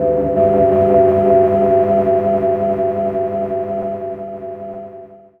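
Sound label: Pad Synth; Equipped Music; Loungin House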